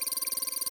count Up; netent; multipliermayhem

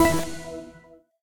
spin Button Click; twinspin

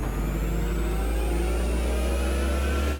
near Win; netent; multipliermayhem

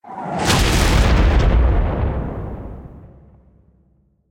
bomber bomb blast; netent

